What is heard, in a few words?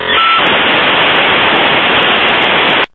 Modem; Modem 4